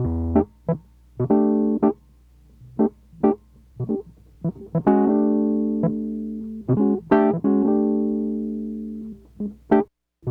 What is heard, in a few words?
wurly, analog funk; Mixed Berry Jam; Sonic Foundry (Sony Creative Software) - What it Is! 70s Analog Funk